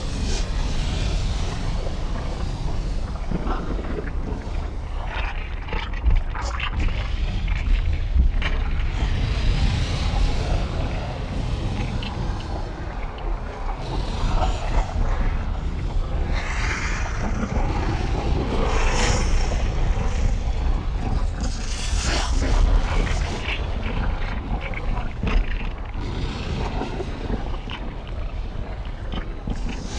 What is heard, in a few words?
ambience